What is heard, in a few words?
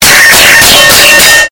rock